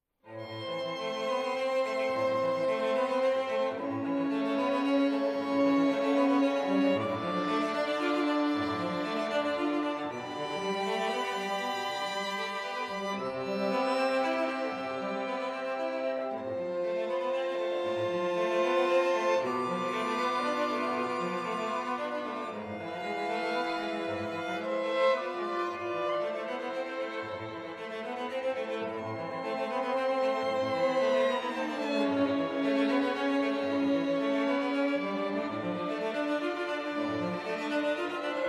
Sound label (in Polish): Temat z glissandami w tle; Mewa